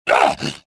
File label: pain